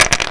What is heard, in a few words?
bones